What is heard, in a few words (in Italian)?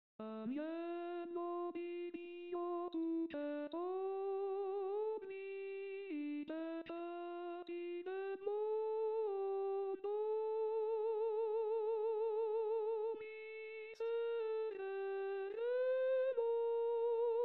Agnello di Dio Tenori